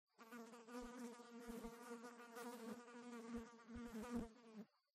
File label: flies; Golden Crayon Sounds